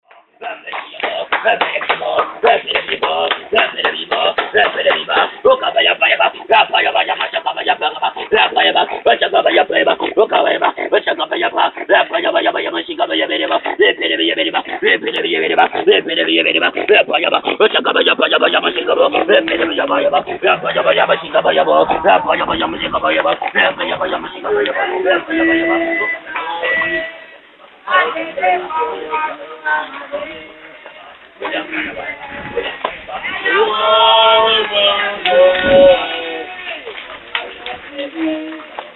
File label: prayer camp